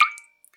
Drips

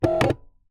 Single Shunt, DVD Drive External

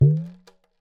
Talking Drum; Percussion Samples

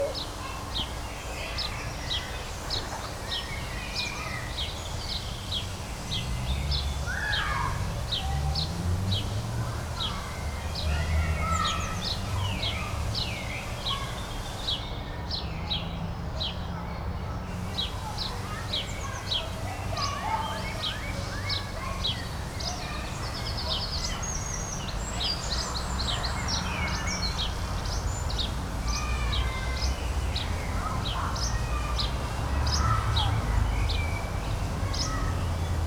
City Garden Birdsong; Animals

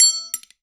Aluminium Tube Drop

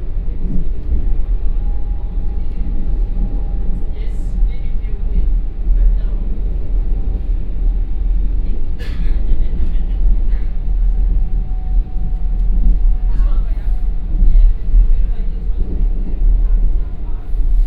S Bahn Berlin; Sound Scapes

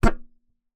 Melodica Short Note

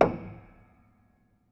Hamm Perc, Piano Hammer Perc; Piano Miscellaneous

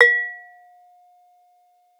Bonang HPF, Gamelan

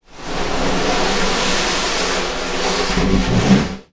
Snare Drum; Percussion Samples

